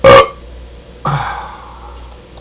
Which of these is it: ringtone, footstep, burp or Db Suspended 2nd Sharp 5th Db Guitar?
burp